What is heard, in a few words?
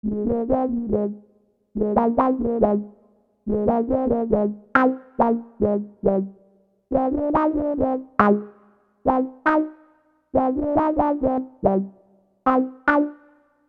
Keyboard, Soul; Sonic Foundry (Sony Creative Software); Infinite Soul VOL-1